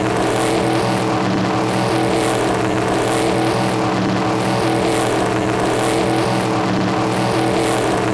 EXT low, Vehicle; NASCAR Thunder 2004

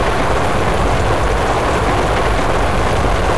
gravel wet, Vehicle; NASCAR Thunder 2004

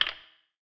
clunk; NASCAR Thunder 2004

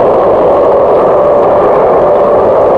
scrub road dry, Vehicle; NASCAR Thunder 2004

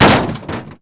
hit wall; NASCAR Thunder 2004

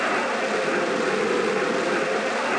skid road wet, Vehicle; NASCAR Thunder 2004

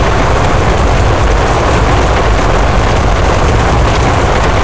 gravel dry, Vehicle; NASCAR Thunder 2004

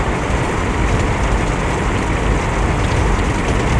grass wet, Vehicle; NASCAR Thunder 2004

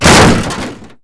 hit vehicle; NASCAR Thunder 2004

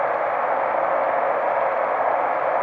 road dry, Vehicle; NASCAR Thunder 2004

